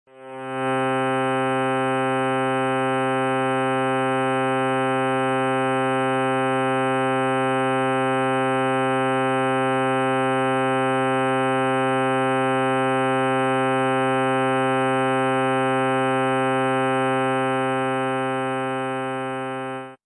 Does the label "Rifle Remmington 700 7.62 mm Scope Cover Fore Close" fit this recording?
No